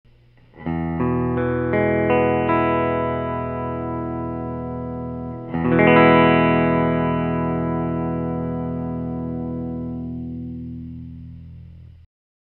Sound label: E m chord, guitar